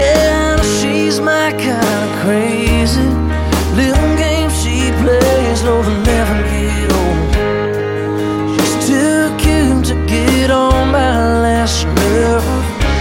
instrumental ringtone

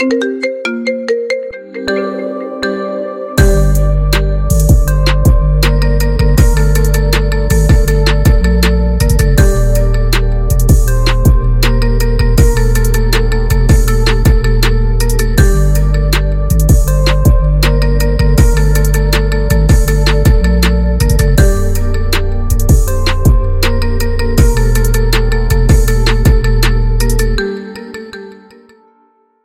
marimba ringtones remix

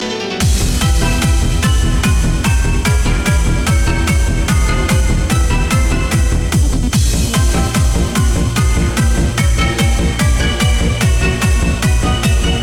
hard christmas ringtone

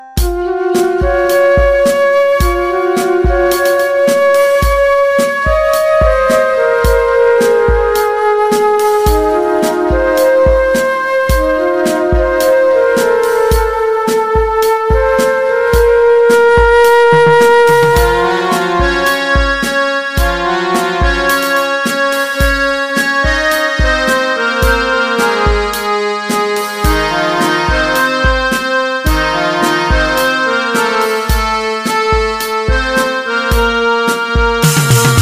instrumental piano version ringtone

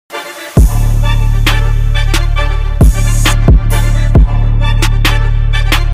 iphone 16 pro max

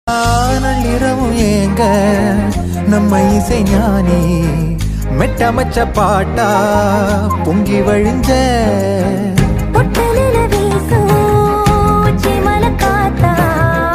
song ringtone; mehandi circus

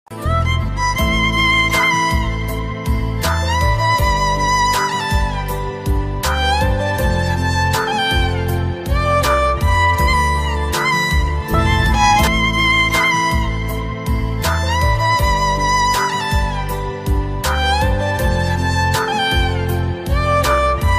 instrumental music ringtone

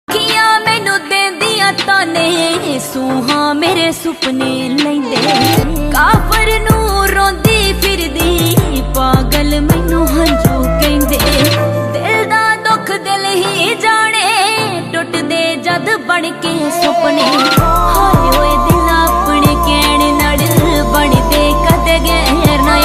song romantic ringtone

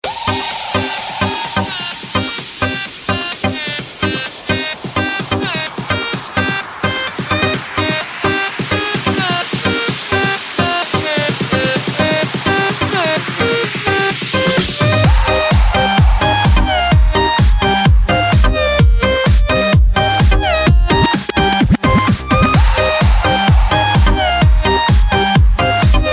guitar ringtones

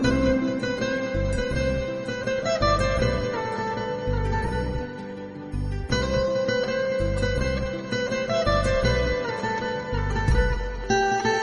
guitar ringtone

